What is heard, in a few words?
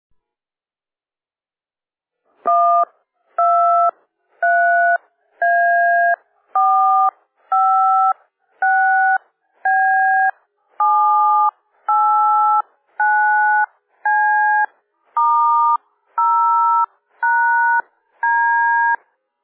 VX-5 DTMF filtered